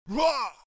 Voices man fight shout; gamerip; Out of Reach: Treasure Royale

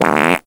Fart

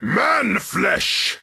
Orc Taunt

